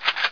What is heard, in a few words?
mp7 draw, weapons